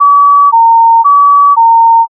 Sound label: ambulance